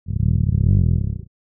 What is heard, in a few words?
Stomach gurgling and rumbling short